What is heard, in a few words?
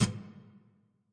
Nylon Hit, Guitar Body and String Hits